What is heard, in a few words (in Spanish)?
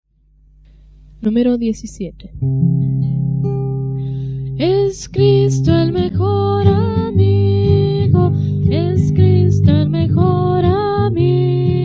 Coro, himnario niños